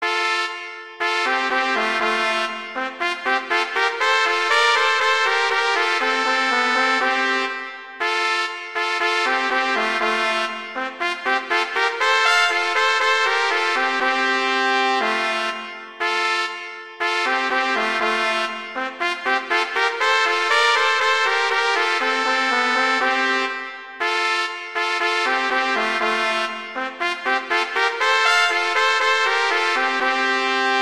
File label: Duet; Mozart; Duets